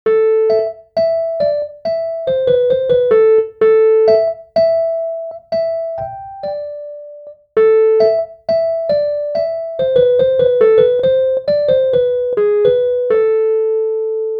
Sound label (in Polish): Fortepian elektryczny